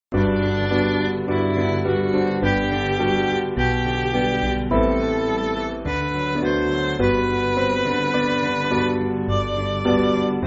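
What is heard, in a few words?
Midi, Music Backing Files for Churches; God Is The Refuge; Lasus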